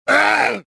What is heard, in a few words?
choke; jedi